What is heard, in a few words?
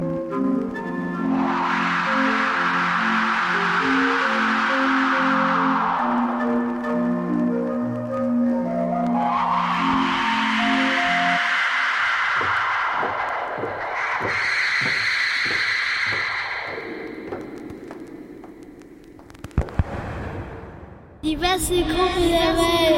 Wind